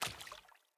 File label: Sandals Walk Water; Player; Footsteps